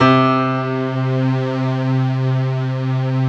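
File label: Piastr, piano strings; Best Service Gigapack 1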